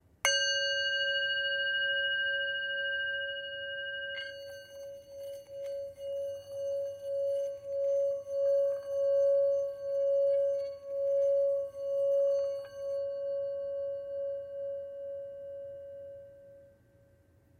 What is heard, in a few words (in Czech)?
tibetska misa; chuto mala